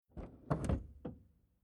Transport Car Classic Bonnet Unlatched From Inside Outside Perspective; Bentley Eight; Car Sound Effects